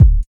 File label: smooth kick drum sample D# key, Royality free kick sound tuned to the D# note